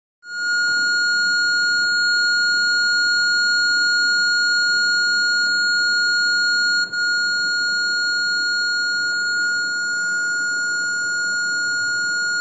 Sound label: High Expansion Foam Release